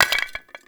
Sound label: Clay Pot Hit Drop; Gameplay; Awakening Remaster